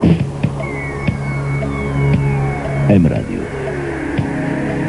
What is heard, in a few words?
jingle m radio